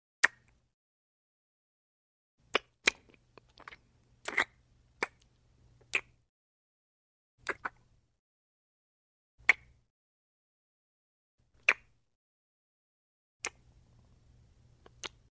Human Soft Light Kisses; Hollywood Edge Foley Sound Library

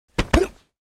Body Impact (Double) With Vocals; Hollywood Edge Martial Arts and Human Impacts

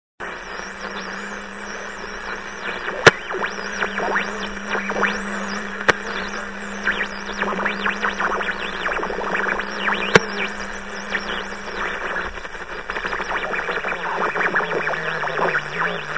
Tuning Short Wave Radio; SOUND EFFECTS1

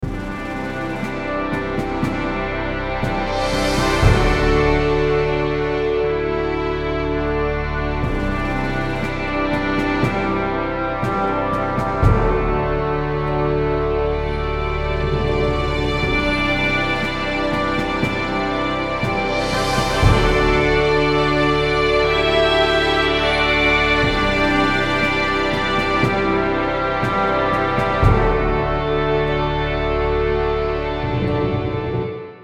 Background, podcast music

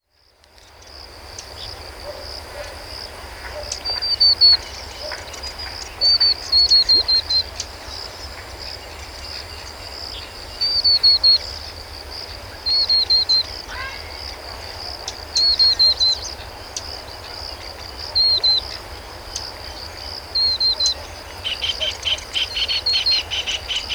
Wetlands Waterfowl and Crickets; Dr. Sound Effects; 210 Nature Sounds - 20 Hours of Relaxing Natural Ambiences for Meditation and Sleep

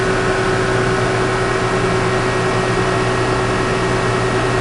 motor, industry; 1000fx

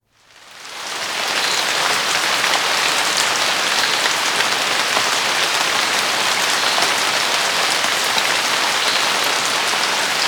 Heavy Summer Rain; Dr. Sound Effects; 210 Nature Sounds - 20 Hours of Relaxing Natural Ambiences for Meditation and Sleep

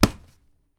SLAP, FIGHT; 1000fx

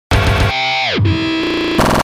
Stoned Guitar, Stabs & Bursts; Best Of N2O Library

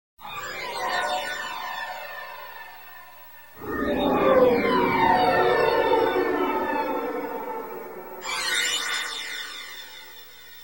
synthesizer; SOUND EFFECTS1

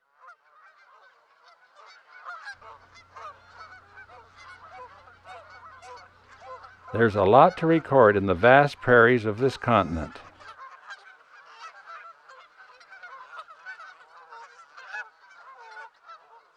Canada Goose; Western North America; Dan Gibson; Wildlife Identification By Sound

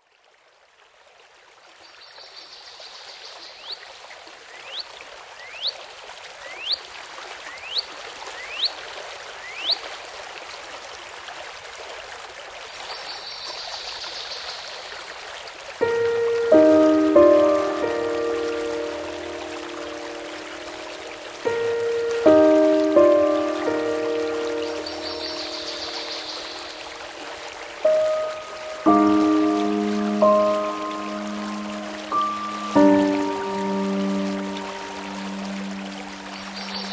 Northern Waterthrush

